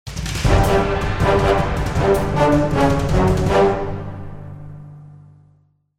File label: MUSIC Victory